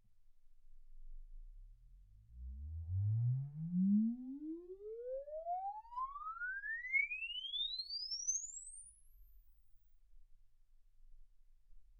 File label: exp swept sinus 10Hz 24kHz